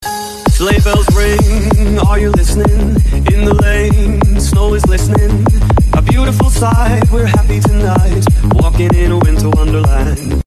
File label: bells ring; slaybells